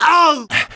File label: HURT; TrueCrimeStreetsOfLA; XBOX